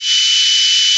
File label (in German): klingeln zischen 3000